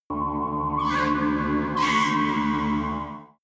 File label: extrinsic soundscape